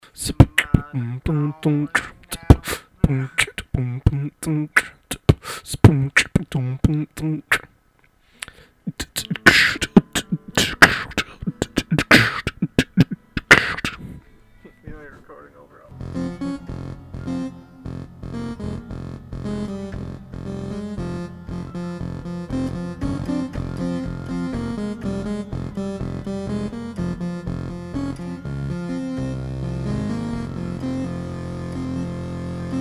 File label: keyboard